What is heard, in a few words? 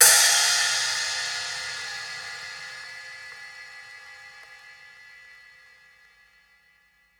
crash; eddyflux; dough-samples